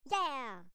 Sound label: cheer